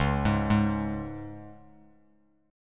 Db 5th Db down Bass Standard; Db5 arpeggiated; JGuitar

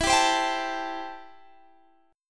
A-Minor 7th E

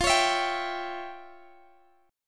E Minor 6th F; strummed; Em6/F

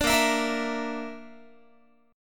A sharp Major Flat 5th, Guitar; A#Mb5; strummed

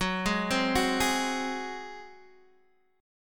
Gb Suspended 2nd Flat 5th Guitar Open D

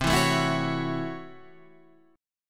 A 6th C, A6/C chord; strummed

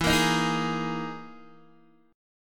E Major 7th Flat 5th E down Guitar Open D

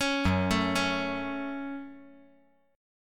Gb Minor Gb

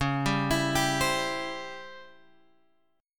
C sharp Minor Major 7th Flat 5th Guitar Open G

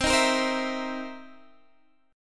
C Minor 7th Flat 11th, C, guitar chord; strummed; Cm7b11

